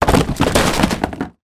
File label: impacts food items fall kitchen shelf; zapsplat